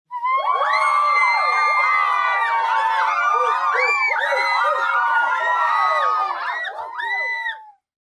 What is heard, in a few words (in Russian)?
radostnyye podbadrivajushhie vozglasy